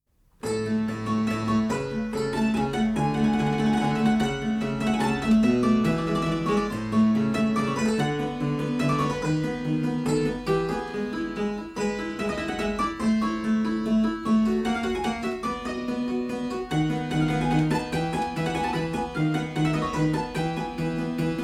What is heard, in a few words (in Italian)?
Pianoforte; Mariano Müller, Sonata per il cembalo